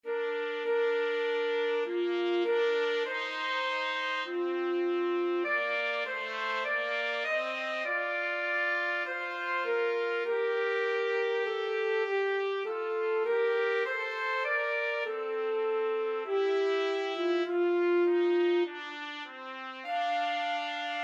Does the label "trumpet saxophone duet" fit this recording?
Yes